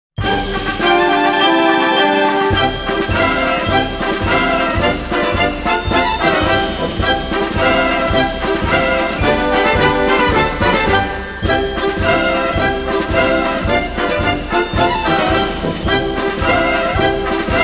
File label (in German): narrhalla-marsch